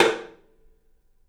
TOM; 3D Sound; 3D Drums

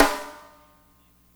FUNK SNAR; Spectrasonics - Burning Grooves CD2

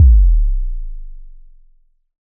KCK, Roland SH-09 synth drums

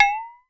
WATER BOT, WATER BOTTLE

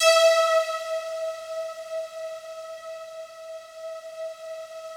FOGATK1 E4, Yamaha VP-1; Music Store; 90's Sample CDs; FOGATTACK 1

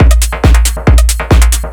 drum, 138, drum-loops, Techno

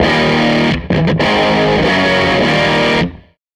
Tele Guitar Loop

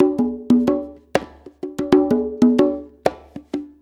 Blues Perc Loop; Drum Tools

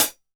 Closed Hat, TR626; Drums; Hits

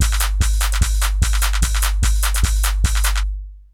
Lo-Fi, Essential beats

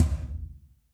TOMS; Zero-G - Chemical Beats

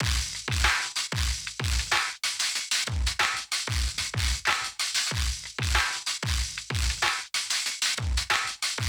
Spacious Loft 94 bpm, drums loops; BASEMENT DRUMS VOL.1

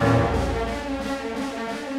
Orchestral Loops; 128 MANHATTAN

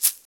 Egg S, Shakers

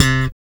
6 STR FUNK; Spectrasonics; Bass Legends

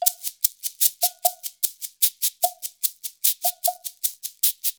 Modern Percussion Loops; Soundscan vol.56; AKAI

